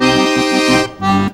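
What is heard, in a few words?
accordion groove; Best Service; World Colours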